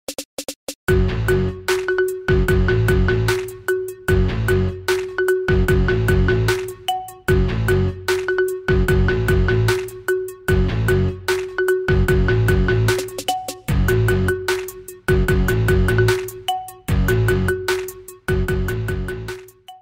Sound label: Marimba Cover